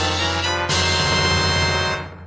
item get; zelda

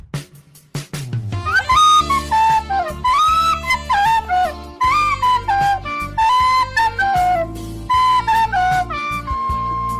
fail

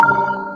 level selected; geometry dash

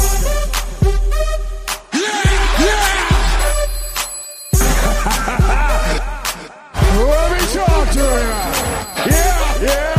yeaaahh; la knight

